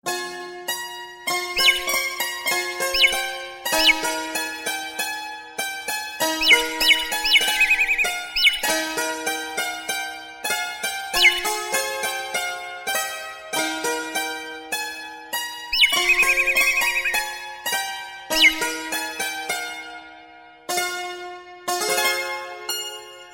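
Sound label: beautiful effect, ringtone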